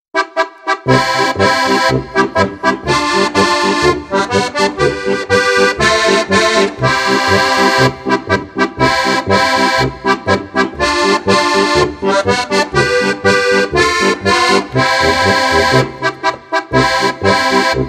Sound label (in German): polka